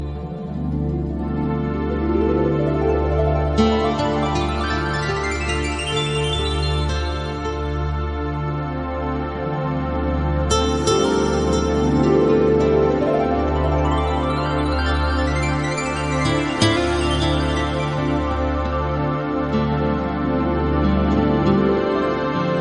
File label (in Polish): tak romantyczna gitara